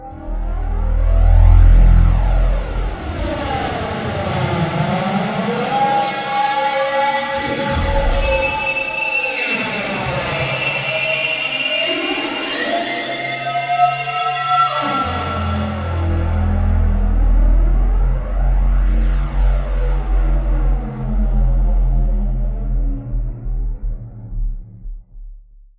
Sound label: FX SWEEP